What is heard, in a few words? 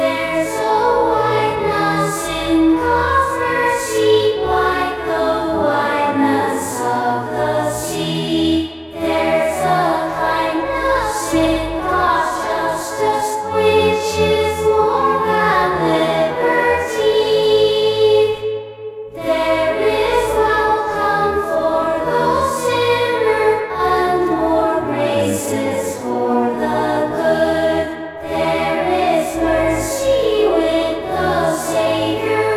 choir